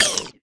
Monster Death

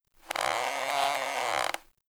hanging beam creak very subtle; adams venture origins

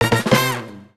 BGM item get; Yo-kai Watch Busters Akanekodan

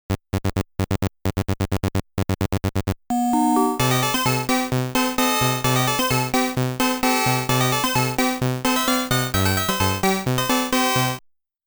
Name Entry Ending; Mappy

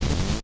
Door Open

